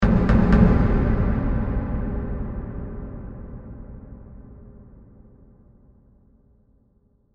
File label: Hit Wood Dark, Knock; BlastWave